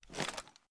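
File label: ar drop movement; weapons; gmod; viper; scharlie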